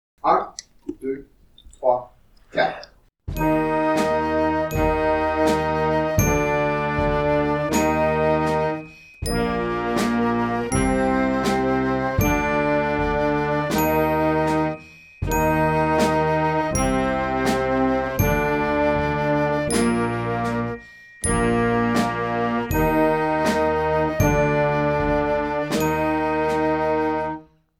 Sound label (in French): mi bemol quon sert tot noire a 80